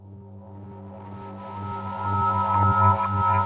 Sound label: Reverse Fx; musicradar